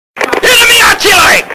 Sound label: Enemy Artillery